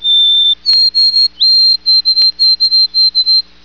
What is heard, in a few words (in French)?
bruant gorge blanche